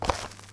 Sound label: Cardboard Foot Step; Welcome to the Game II